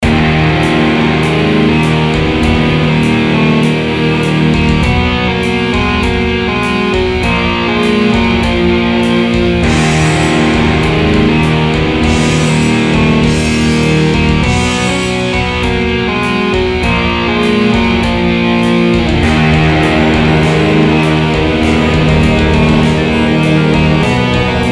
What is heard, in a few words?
Metal